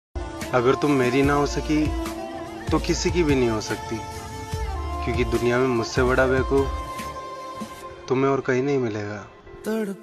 very sad song status broken heart breakup song hindi